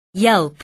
yelp

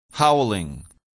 howling

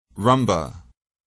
rumba

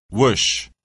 whoosh

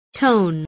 tone

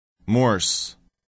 morse

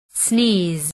sneeze

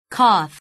cough

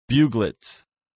buglet

